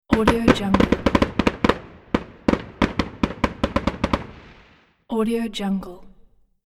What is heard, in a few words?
fireworks; تیراندازی و درگیری; urban sounds